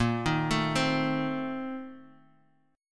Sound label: A sharp Major 7th Suspended 2nd Suspended 4th, Bass Standard; arpeggiated; JGuitar